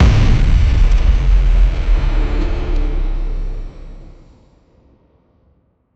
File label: landing impact; sound effect